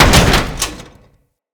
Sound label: Body Fall Default; Moa; Body Falls